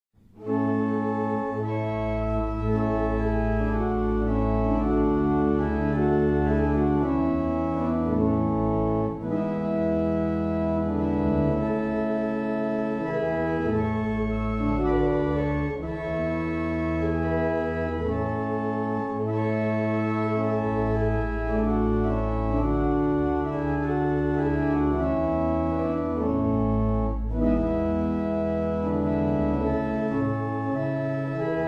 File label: Helmsley, barrel organs; Meldreth